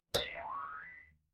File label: cartoon boing